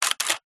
technology camera dslr take picture shutter close open; zapsplat